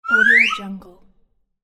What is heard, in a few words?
slide whistle up